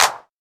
Smooth Clap Single Shot A Key, Royality free clap one shot tuned to the A note